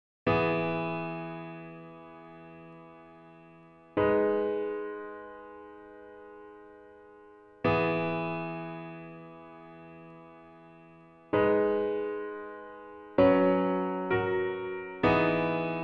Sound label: Piano